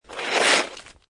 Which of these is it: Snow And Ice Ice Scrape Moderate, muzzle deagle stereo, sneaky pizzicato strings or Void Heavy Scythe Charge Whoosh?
Snow And Ice Ice Scrape Moderate